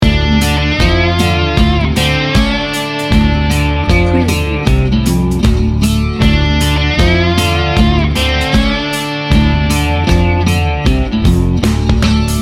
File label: swamp gator loop